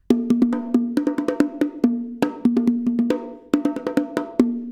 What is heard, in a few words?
bongos